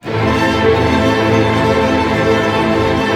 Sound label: ARP A, STR ARP MIN; Sound On Sound - Peter Siedleczek's Orchestra